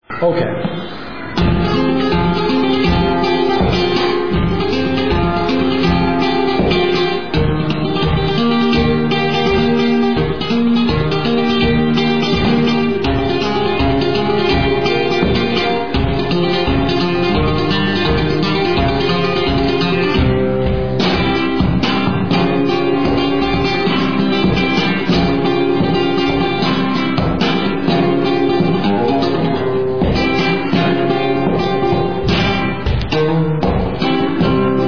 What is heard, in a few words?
Bulerias Tempo, guitar, Sevilla